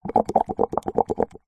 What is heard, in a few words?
milkshake thick blow bubbles through straw in glass short burst; food drink; zapsplat